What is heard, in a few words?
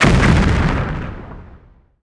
explo large